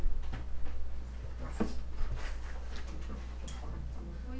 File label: meow